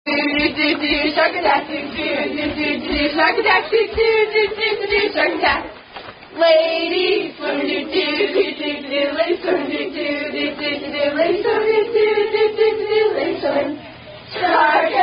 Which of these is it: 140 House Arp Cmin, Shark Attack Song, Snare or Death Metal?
Shark Attack Song